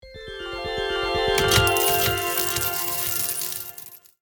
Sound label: Slot machine casino bonus round end